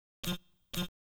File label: Guitar, RnB